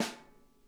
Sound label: SNARE, Drum Kits